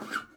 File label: SLIDE, Blues Guitar; ILIO; Fretworks; Blues Guitar Samples; SIMMS RIFF